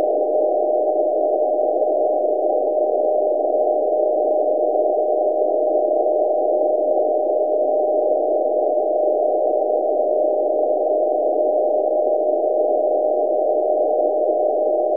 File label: K3 2kHz Tone 500Hz BW